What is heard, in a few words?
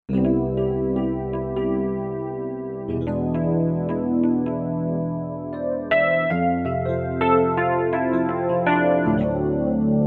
Jazz Chorus